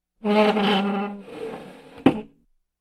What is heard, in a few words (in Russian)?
Taburetku stul dvigajut